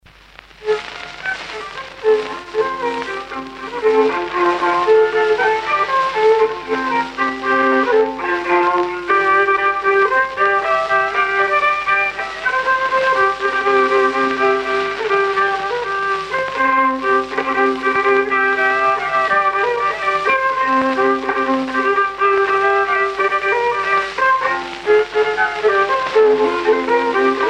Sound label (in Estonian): polka viiul folk; Kuusalu; Kunda